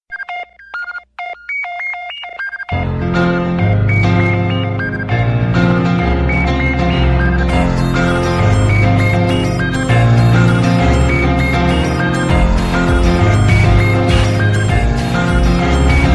Nice Musical Tone

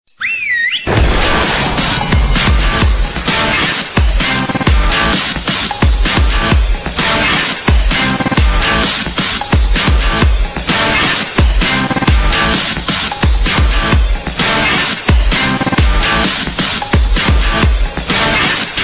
Car Theme

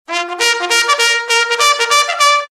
Fanfare

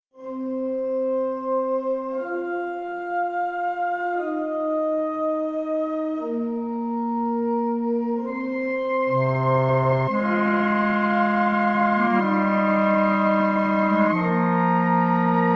Wake Up Smooth

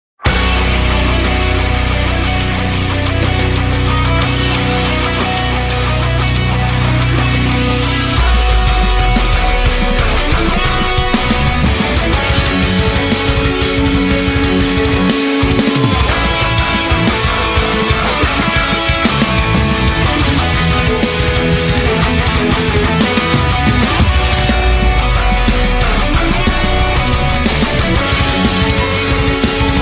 Metal; GOT

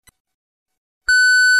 Htc Beep

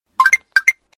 Knocking Bird

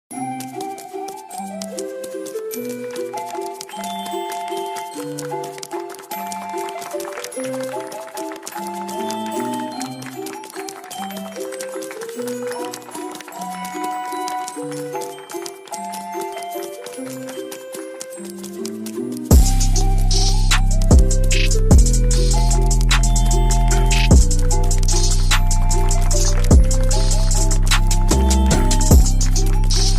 Trap

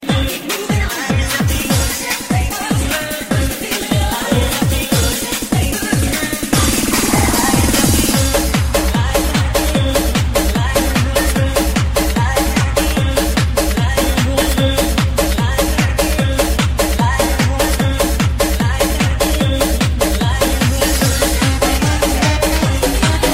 dance dance mix

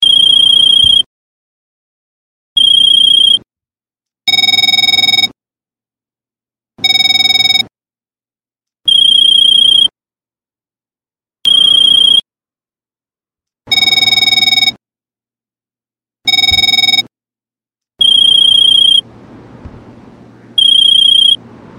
phone ringtone; GTA

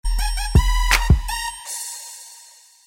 Snap

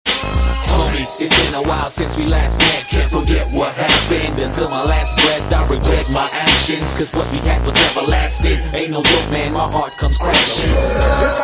Tamil Rap

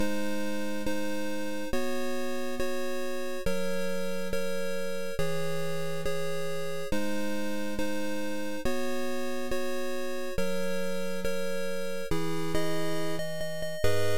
8bit

